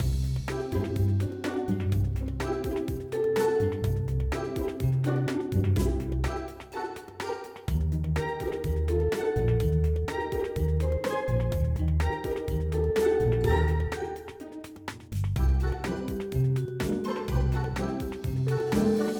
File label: Roomdeco Loop; miraculous life android ios mobile gamerip 2022